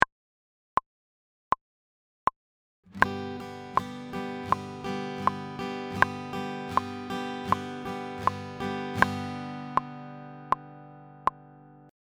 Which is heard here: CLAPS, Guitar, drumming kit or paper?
Guitar